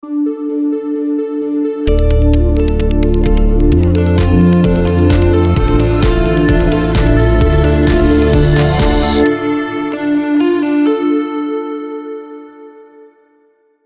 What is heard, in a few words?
take off jingle